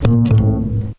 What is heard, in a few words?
Upright F, Basses; Total Drum&Bass